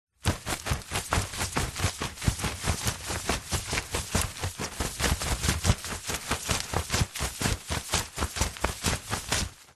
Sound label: umbrella flap continuous; foley